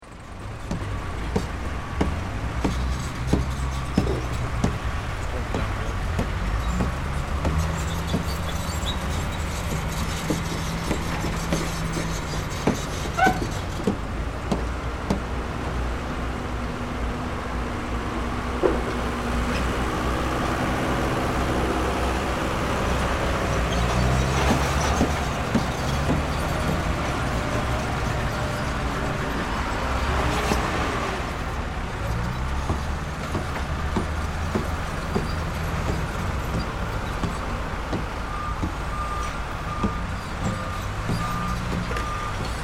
Ambience Construction Site Heavy Activity Hammers Trucks Tractors Industry